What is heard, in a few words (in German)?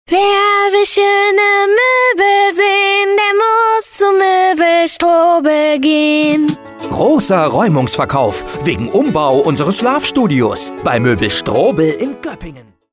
Gesang; Möbel Strobel